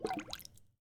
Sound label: Bubbles